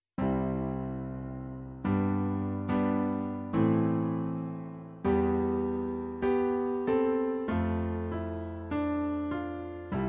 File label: Canon C, keyboard